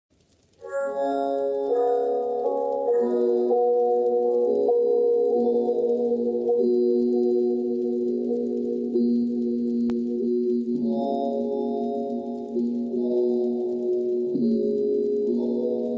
old scary song; roblox

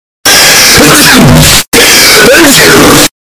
loud earrape sneeze

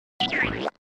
Icky Squish Anime

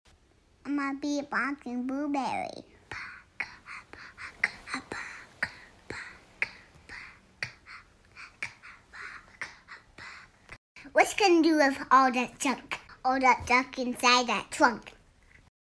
Im a beatboxing blueberry

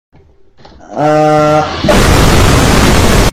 Screaming

